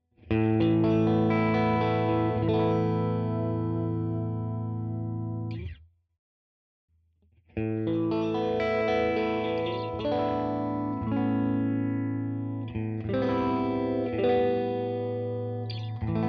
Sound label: Mod Phaser; Script